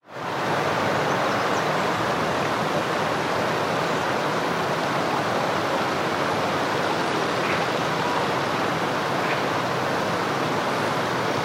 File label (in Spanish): Entorno Natural De La Igesia De Santa Maria Del Naranco; oscardeavila